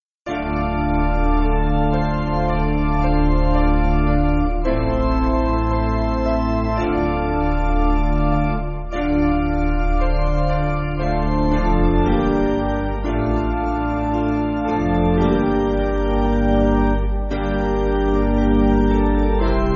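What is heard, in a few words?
Organ Piano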